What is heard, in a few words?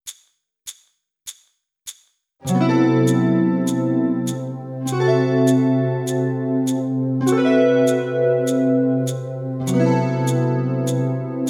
Fretboard Navigations, Guitar; Guitar Techniques 2018 Issue 289 December